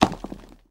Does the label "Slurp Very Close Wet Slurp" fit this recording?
No